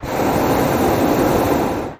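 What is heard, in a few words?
Hot Air Bllon Burn Me, Hot Air Balloon Burner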